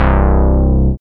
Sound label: BAS, Eurotech; Sounds Good